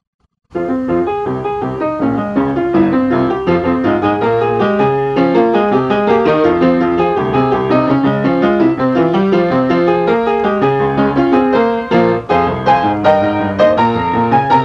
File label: clock, piano